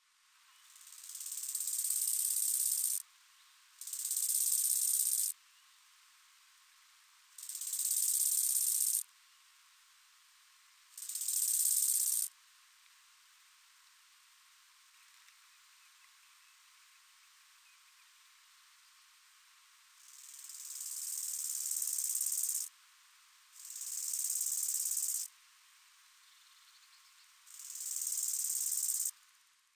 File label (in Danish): syngende markgræshoppe